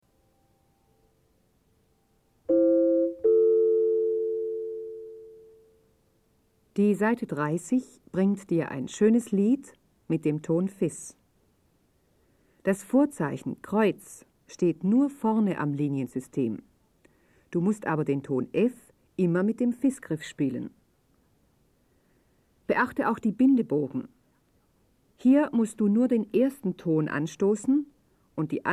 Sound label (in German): Spiel Blockfloete